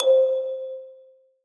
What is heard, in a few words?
Noises Kalimba; Synthetic's Serum Collection; Production Master - Vital Future Bass 4